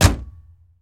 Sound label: Truck Explorer Hood Close; Trucks